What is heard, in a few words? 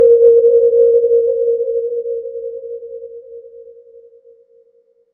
Console Ping; transporter lock